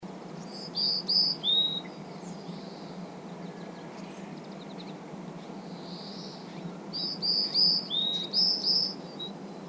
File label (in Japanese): yamagara